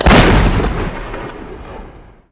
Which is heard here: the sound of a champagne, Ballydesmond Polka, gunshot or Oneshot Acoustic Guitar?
gunshot